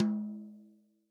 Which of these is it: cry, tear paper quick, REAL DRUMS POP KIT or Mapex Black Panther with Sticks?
REAL DRUMS POP KIT